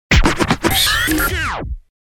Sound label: Triple Skid Start Up; DJ sounds